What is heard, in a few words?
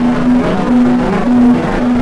overcharge loop, weapons